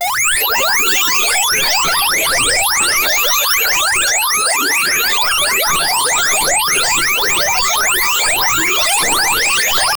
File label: action